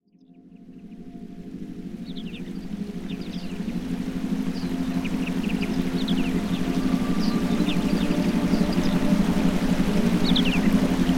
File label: Deep Alpha Relaxation with Forest Creek Sounds